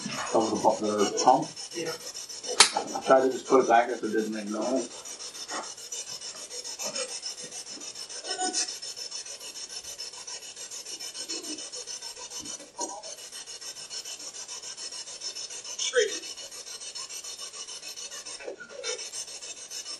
Room 428 Spirit Box

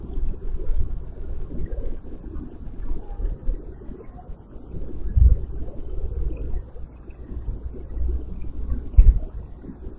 Static

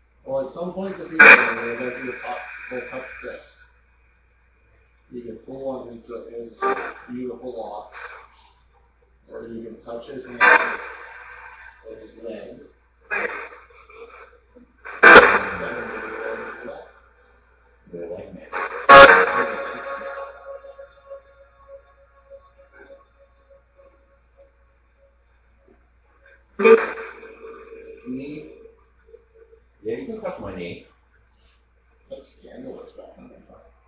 Living Room Portal

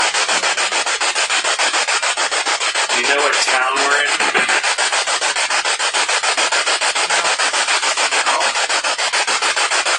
Spirit Box; Attic Small Room

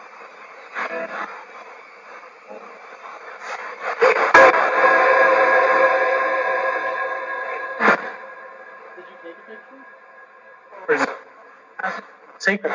Library Spirit Box

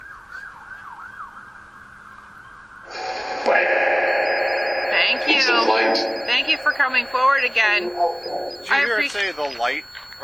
Open Field Phasma; martinsburg roundhouse investigation

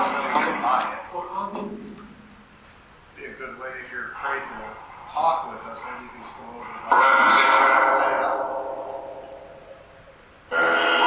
Auditorium Phasma Box; beaver area high school investigation

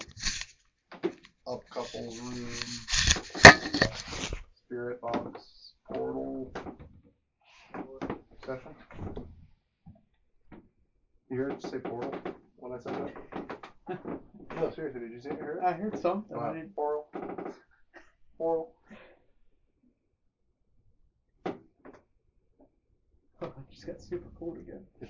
Up Couples Room Spirit Box; wexford general store antique shop return investigation

